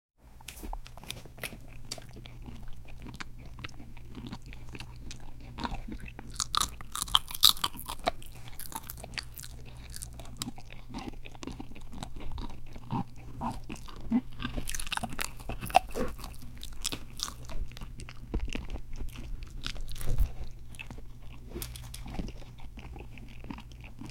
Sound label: asmr dog chewing and eati; crunching